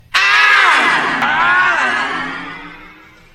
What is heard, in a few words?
ahh ahh weird